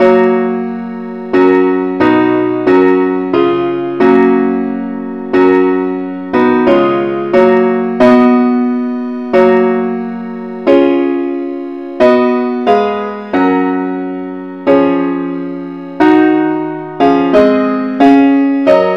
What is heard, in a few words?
parts only, key fis; christe Sanctorum